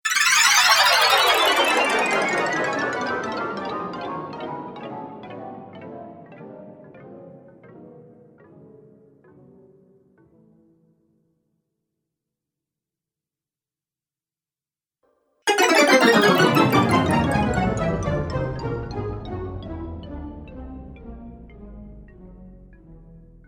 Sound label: Fantasy Magic Slow Time Collection; Magic Sound Effects